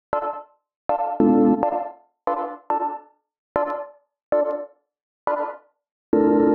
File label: Bpm140 Wonky Chord Loop Dm, Dubstep